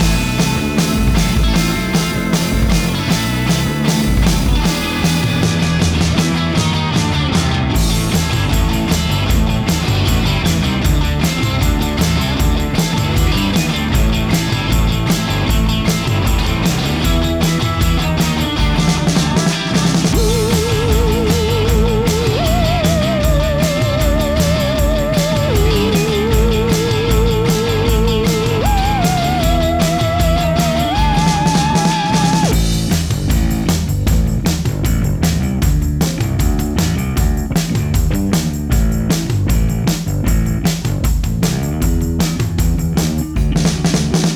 Rock; Laboratory Rock; Meow Motors

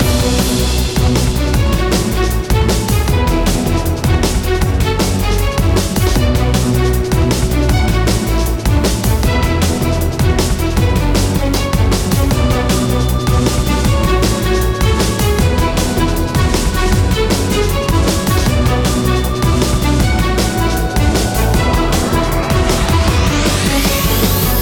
music storm; Castle Storm

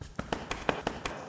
running; Five Nights at Ronald's

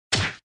Sfx Hero Hit

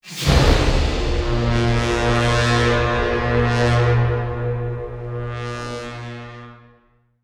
Lose

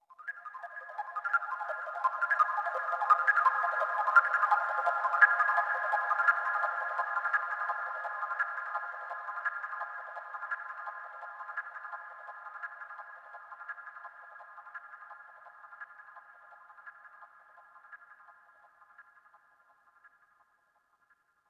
Overlay Tone; Flytrap

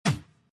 Pain hit bear

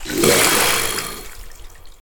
WakeUp; Z Bolotnik; 30 Days to Survive

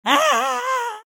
Cry; Troll Face Quest Unlucky; Android

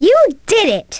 correct; lego my style kindergarden

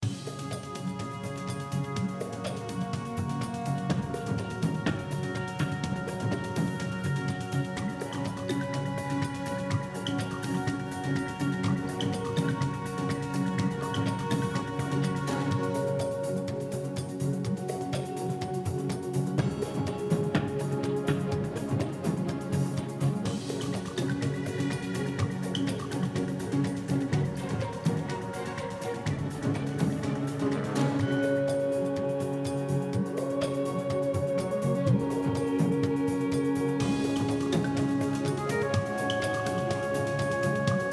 Music; Tower Climb

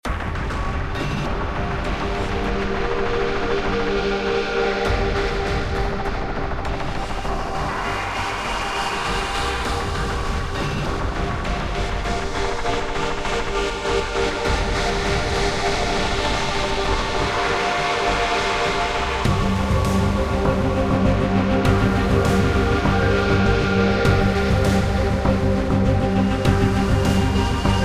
Exploration Meteors; starsmashers

